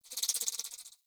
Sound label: Corrupted Critter Close; Ambience; Mendicant Area